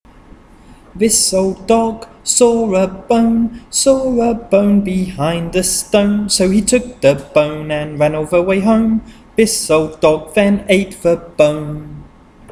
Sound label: Medial Long Vowels Chant o_e